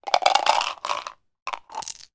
leisure board game dice pour from shaker into hand; yahtzee